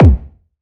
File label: BD, BASS DRUMS; EdgeSounds